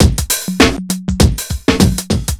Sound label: shufflin beat